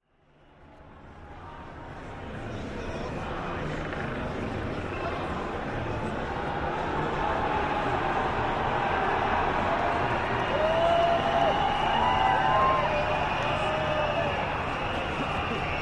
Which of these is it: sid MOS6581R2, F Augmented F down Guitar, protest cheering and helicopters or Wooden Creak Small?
protest cheering and helicopters